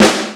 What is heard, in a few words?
SDS2000 SN; SDS2000 Factory2